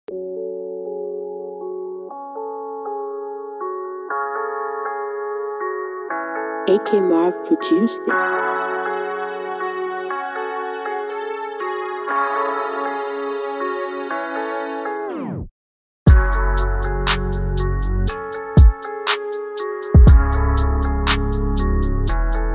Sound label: Type Beat